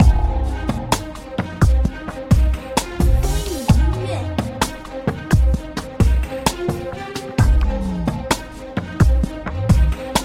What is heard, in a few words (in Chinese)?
电子 危机 气氛